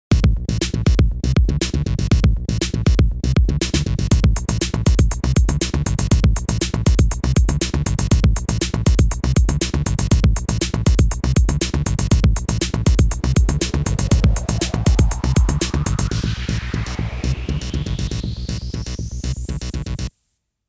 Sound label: Music Bed